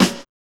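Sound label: HSE-SD, house snare drum; X-Static Goldmine 1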